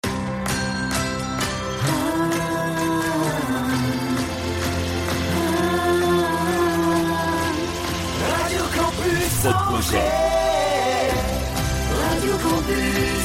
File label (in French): Jingle Chante